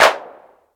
clap sample d sharp key, Royality free clap sample tuned to the D# note. Loudest frequency: 1647Hz; single hits one shots